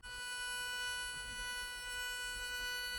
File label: violin A pitch shifted